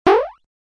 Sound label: Arcade 8 bit jump